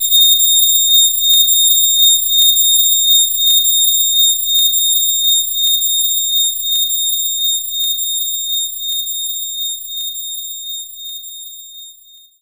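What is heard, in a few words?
Pipe Organ G#2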